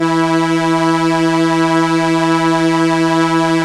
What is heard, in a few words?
STR ARP, ARP Strings, ARP Slow Str; Roland LCDP09 Keys of the 60s and 70s 1